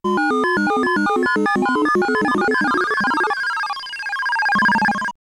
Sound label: Game Over Music; Phozon; psx